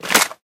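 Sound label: inventory items weapon; STALKER; IWP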